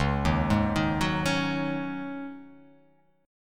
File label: C sharp Suspended 2nd Suspended 4th, C sharp